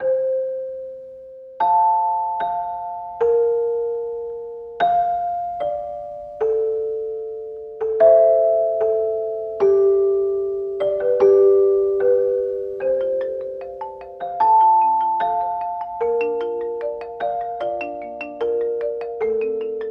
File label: Marimba, Vibes, Chimes and Bells